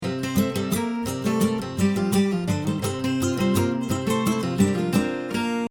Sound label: AC Guitar Mix